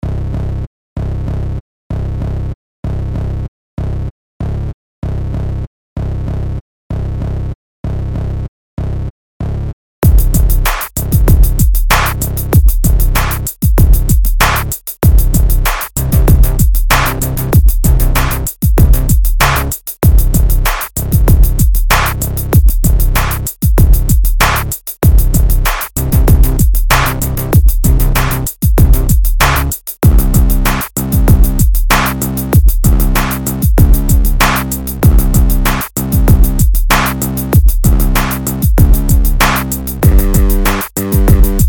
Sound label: dark bassy